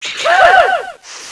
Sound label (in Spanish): eco